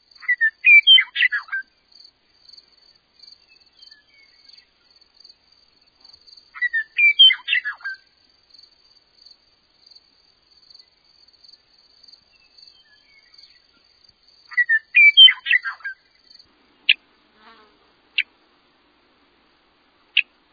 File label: Western Meadowlark song